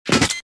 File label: stick, detpack; weapons